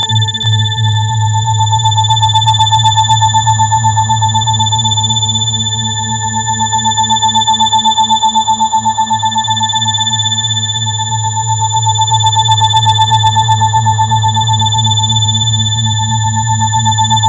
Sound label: Isochronic SDR